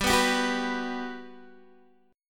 G Major 7th, G, down, Guitar, DADGAD

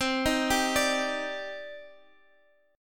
Eb Major 7th C; Ebmaj7/C chord; JGuitar

